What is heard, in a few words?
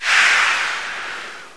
MISS GOAL; swos-soundset